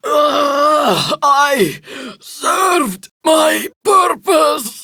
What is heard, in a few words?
Death Prime Grineer Female; Tau; Battle Barks